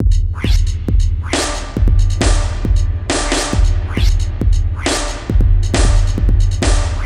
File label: Dark Industrial Loop 136bpm